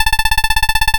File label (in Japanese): 会話; nes retro talk sound effects